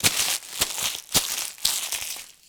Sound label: crushing paper bag; Trash; 3maze